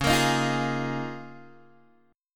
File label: C sharp 6th Add 9th, Guitar, Open G; down; chord shape